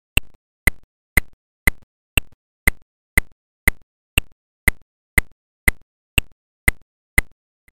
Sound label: click